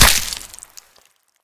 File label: Bite; MiSide